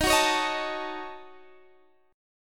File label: D sharp Minor 7th Flat 5th D sharp, down, Guitar, Drop D